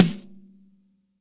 Snare

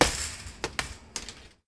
Shopping Cart Hit

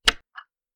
Breaker Switch

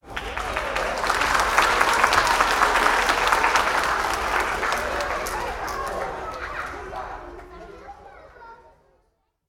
Gentle Audience Clapping

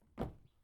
Jump Landing Wood

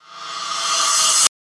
Rev Cymb Reverb

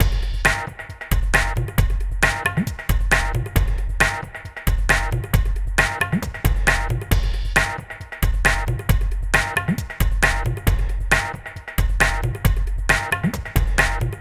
Poot 135bpm

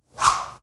Swoosh Whip

